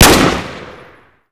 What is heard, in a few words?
p90; Modern Warfare